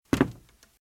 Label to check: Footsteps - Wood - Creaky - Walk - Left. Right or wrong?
Right